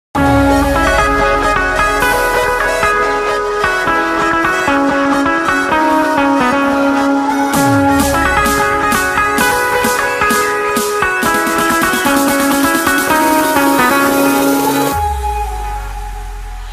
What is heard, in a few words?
Starting Music Ringtone; Bollywood; Race 3